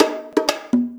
conga bonga; Drum loops

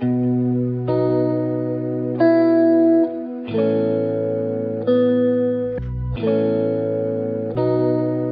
guitar, Loops, Sad

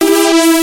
notes, Synths

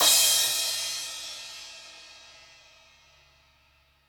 Cymbals

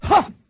huh 8 bit arcade guy; ooh ahh; heh huh

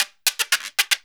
to da beat yall, Drum loops

